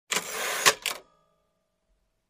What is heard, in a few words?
Printer Sequence